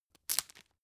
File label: tissue open